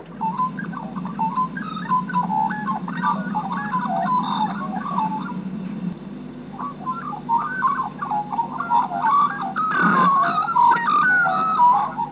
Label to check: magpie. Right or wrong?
Right